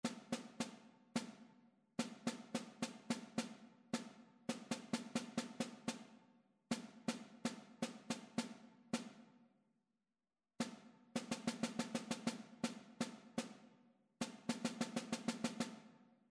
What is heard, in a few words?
rhythmic error detection; ear training